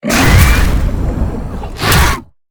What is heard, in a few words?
creature chelicerate exoattack enter